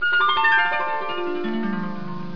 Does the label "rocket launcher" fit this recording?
No